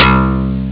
bass cool; ST-XX Amiga Soundtracker Sample Packs

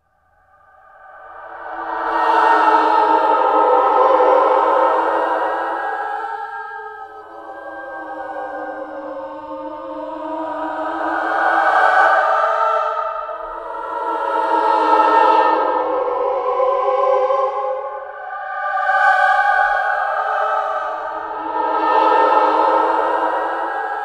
WINDY MOAN; VERTIGO

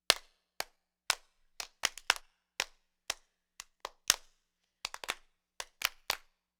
Handclap Rhythms, Ukraine Gypsy; Sonic Foundry (Sony Creative Software); Continental Drift Aftershocks

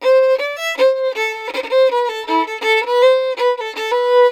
VIOLIN; USB Soundscan vol.42; Celtic Flavours; AKAI

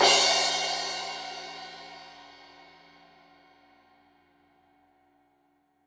Crash 15in L edge, Cymbals Stereo

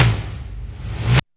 REV TOM LO; THE REVERSER; Back In Time Records; Big Bang

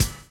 DRUM CHOP; AMG Gota Yashiki Groove Activator

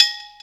Internal Drums, Roland JD800

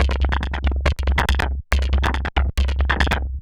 perc 140 flux, Percussive Loops 140 bpm

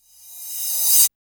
RV SCYM, JUNGLEKIT; Drums In Your FACE!

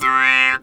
Talk Box; E-MU Producer Series Vol. 8 – Platinum Phatt

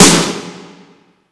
Snare Noisy, Yamaha PSR E305

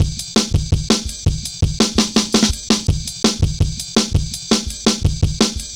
Jazz Ride A, Drum Loops, 166.5; Jungle; Zero-G Jungle Warfare 1, 2 & 3; 90 s Sample CDs

